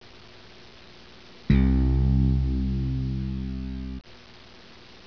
bass

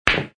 ball collide; lightning break

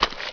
step mud; SpecOps2 MPlayer Demo

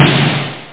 snare

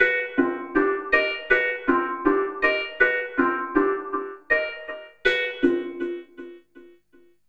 SYNTH, Ambient, Keys; eJay

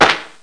SAND

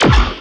bullet; Dreamstars

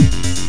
beat

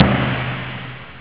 tight, drums snare; OctaMED SoundStudio V1

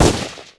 shot; urbanchaos

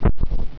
Thump; F/A-18 Hornet 2.0

